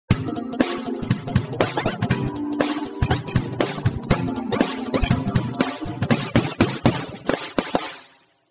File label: Pop